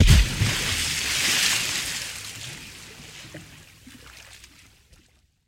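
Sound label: sounds of anchor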